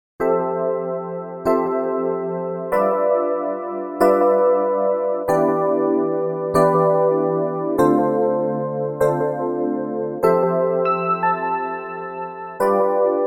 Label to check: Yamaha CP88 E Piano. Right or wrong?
Right